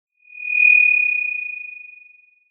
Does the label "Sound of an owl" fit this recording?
Yes